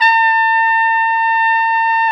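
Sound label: Trumpet mf, Solo Brass; RCA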